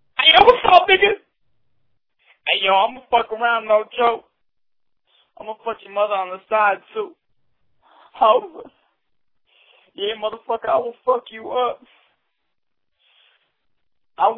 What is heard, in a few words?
crying